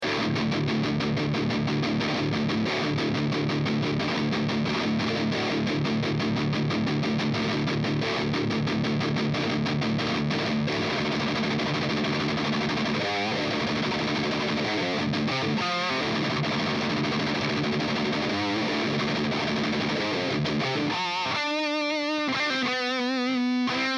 EMG 81 JCM800

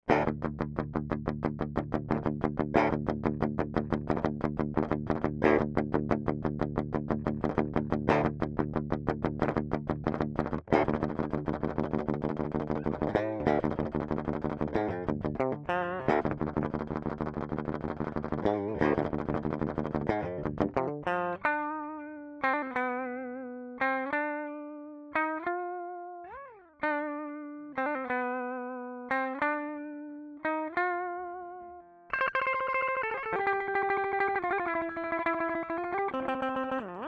DiMarzio Imperium

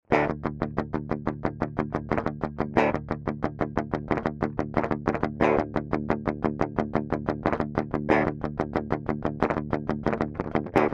Aftermath Pickups; Bare Knuckle Pickups

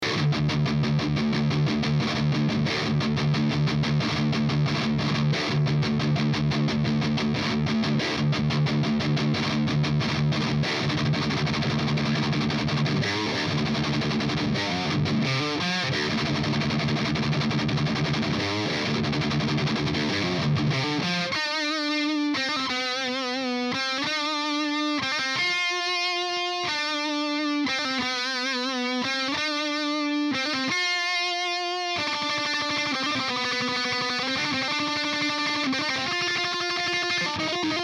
Seymour Duncan Black Winter Rectifier

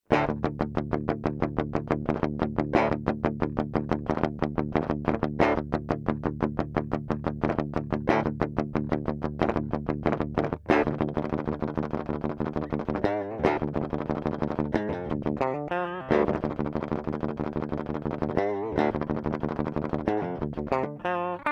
DiMarzio Evo

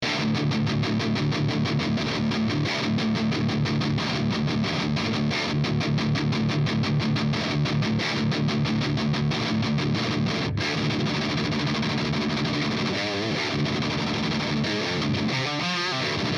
Evo F Rectifier; DiMarzio

